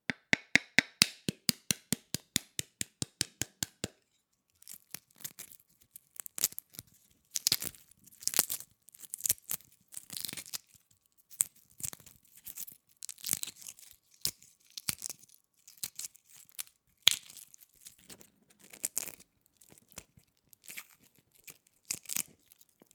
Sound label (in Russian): yaico, zvuk kurinogo yaica